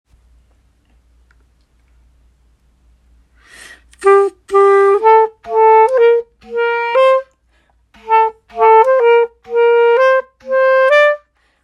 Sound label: Clarinet excerpt